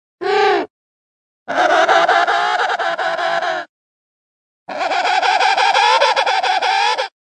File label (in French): manchot empereur